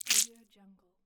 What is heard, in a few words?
fleshy bite